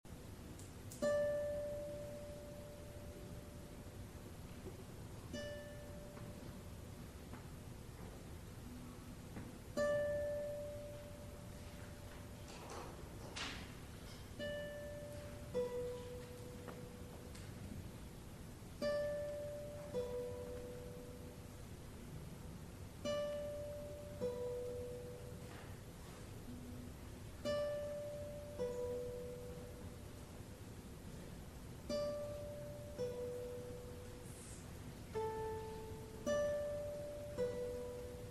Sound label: Guitar Ensemble